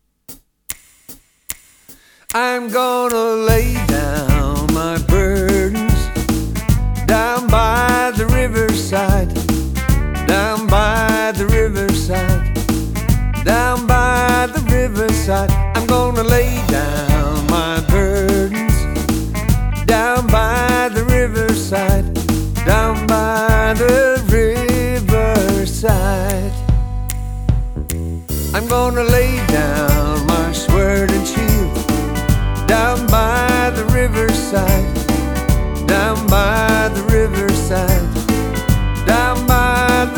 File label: Down By The Riverside To play along